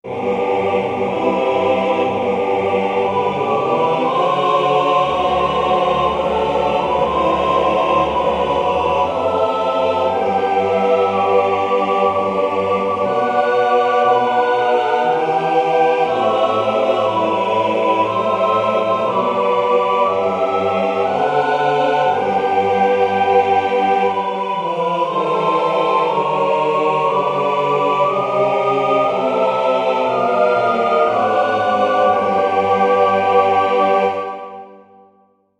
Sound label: happy birthday, Barbershop Quartet Music; Arrangements for Barbershop Quartet